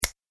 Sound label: Single Finger Snap Click; Body Sound Effects; Human Body Sound Effect; Andrew Fleming